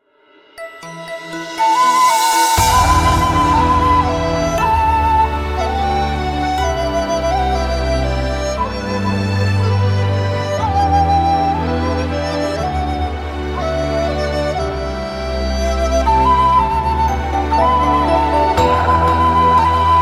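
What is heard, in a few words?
Chinese ringtone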